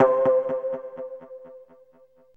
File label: PLING